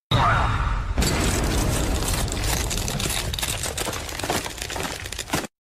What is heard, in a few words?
punch